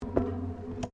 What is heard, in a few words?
Footsteps Boots Metal Spiral Stairs Steps; Footstep Foley; Human